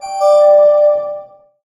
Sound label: door open chime; source engine; portal2; labs